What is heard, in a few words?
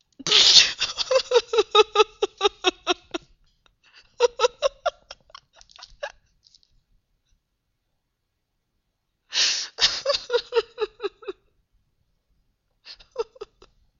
bursting out laughing